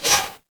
ui toast

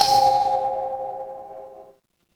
instrument kalimba

